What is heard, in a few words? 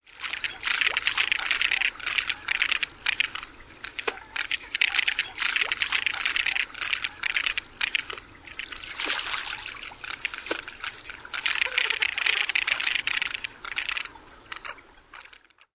male courtship clattering